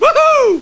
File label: woohoo